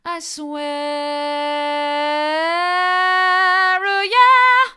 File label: i swear mid, vocal